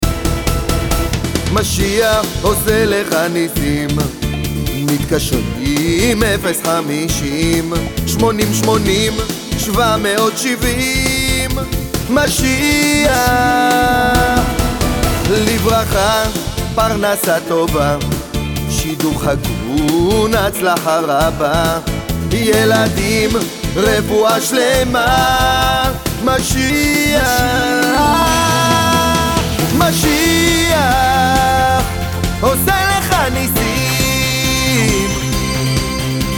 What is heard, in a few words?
hebrew